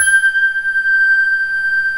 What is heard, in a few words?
SYNTH CARILN